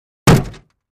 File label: Plastic Car Door Hit